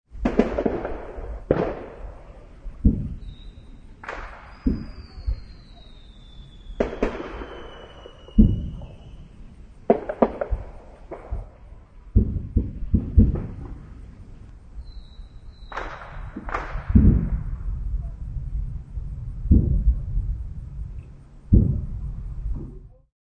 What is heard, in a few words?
Fireworks in Neighborhood, Neighborhood fireworks; Backgrounds